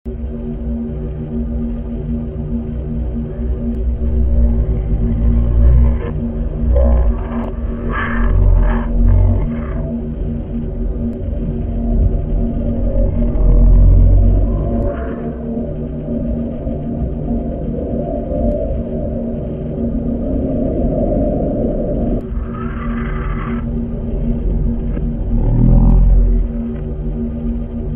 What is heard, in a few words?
AMB